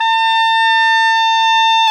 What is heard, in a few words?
OBOE, Orchestral Winds, Oboe mf f